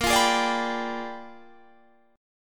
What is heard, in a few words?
Bb 13th Flat 9th; Bb13b9